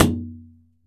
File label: shower, impulse responses; howard fredrickson